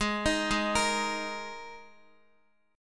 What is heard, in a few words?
G sharp Suspended 2nd, G sharp, down, Guitar; G#sus2; arpeggiated; JGuitar